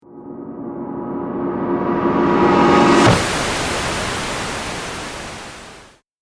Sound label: Trailer Hit White Noise; Trailer Sound Effects